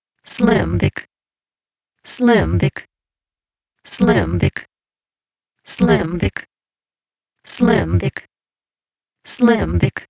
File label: Groove